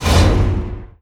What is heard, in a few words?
map quest highlight